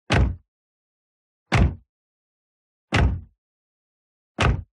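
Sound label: SPORTS CAR Exterior Door Close Medium; TRANSPORTATION; smartsound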